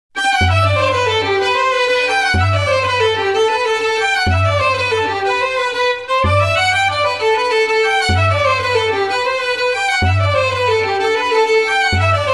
Jig; Soundzabound Volume 2; The Garry Owen Jig

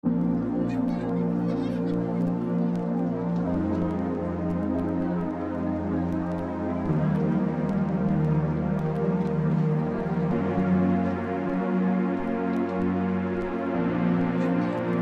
The Lofi Pads

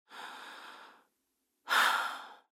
Breathe Exhale

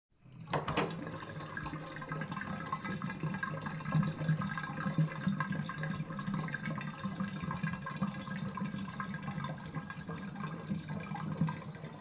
Drain; Soundzabound

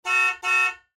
Car Horn

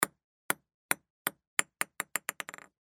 ball bounce multiple

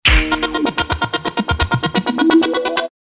Elevate, short; Soundzabound

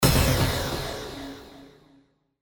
Blast Laser

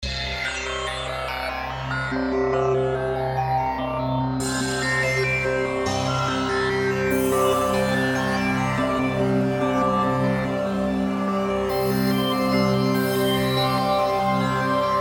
Arpeggio

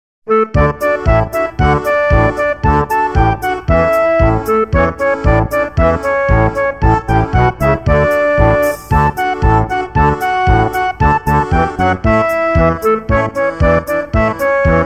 The Dreydl Song; Soundzabound Volume 2

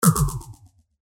Phaser

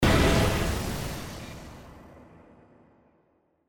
Blast Digital

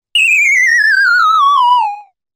Game Show Too Bad; Soundzabound

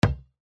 ball bounce single

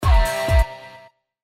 Beat Blast

